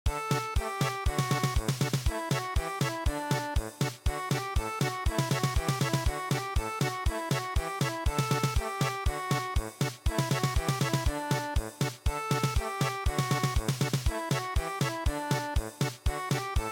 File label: German Polka